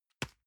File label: envelope drop soft dry